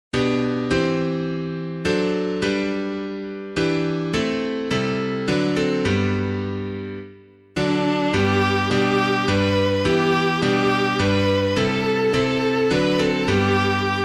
piano

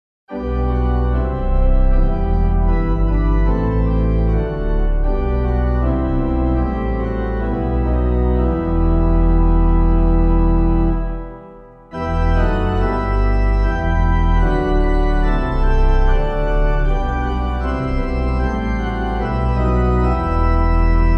organ

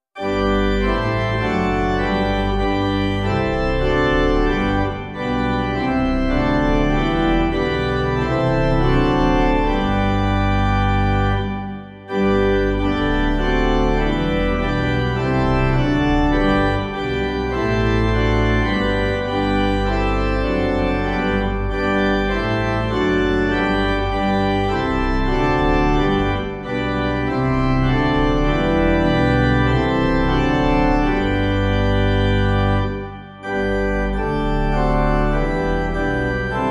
This Season Calls Us to Return, organ

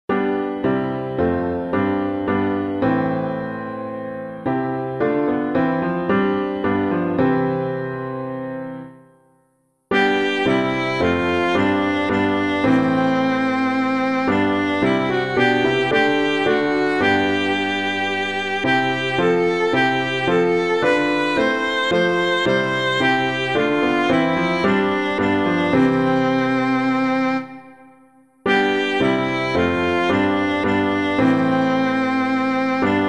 piano; 'Tis Good Lord to Be Here; Robinson; SWABIA

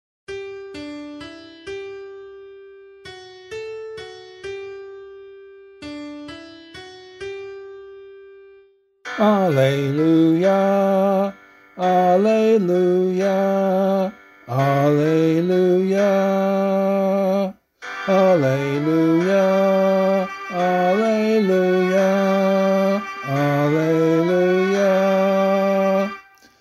Gospel vocal

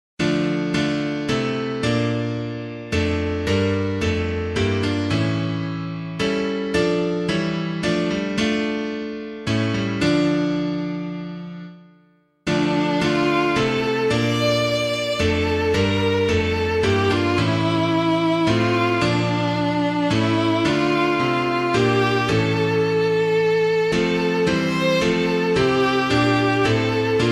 piano; Forgive Our Sins as We Forgive; Herklots; SAINT NICHOLAS

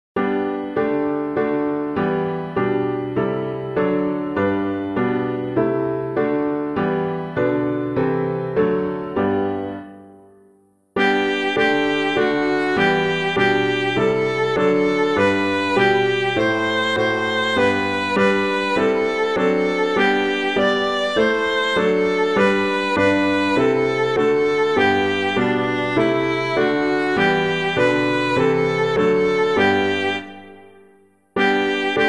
piano

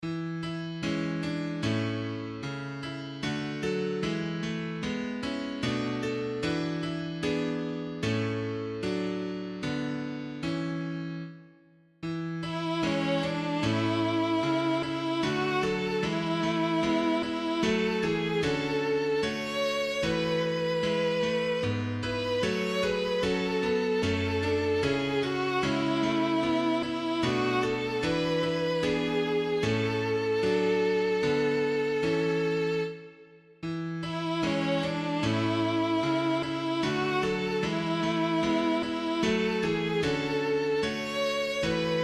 piano, hymn; Be Still My Soul before the Lord; Stuempfle Jr.; SHANTI

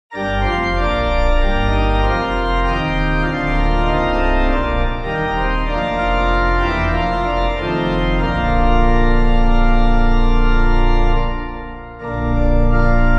organ

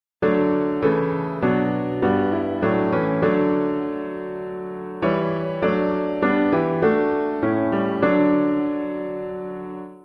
piano; 'Tis Good Lord to Be Here; Robinson; CARLISLE

